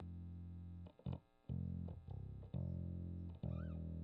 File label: Bass Amp